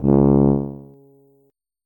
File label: tuba note